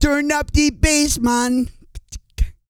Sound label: REGGAE, Vocal Planet World Voices